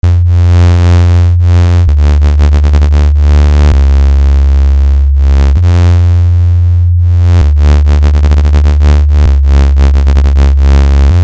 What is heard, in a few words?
wooble bass